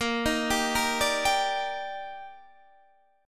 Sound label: Eb Major 7th Bb